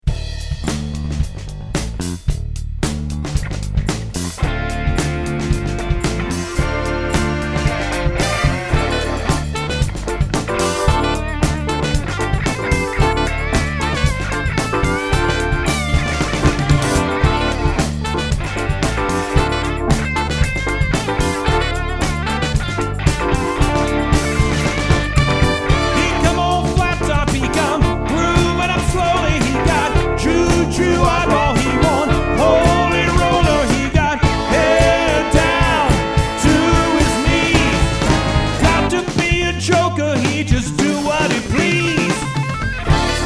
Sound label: Cover Band